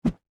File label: turn right